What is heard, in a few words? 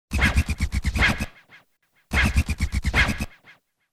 Turntable; Soundzabound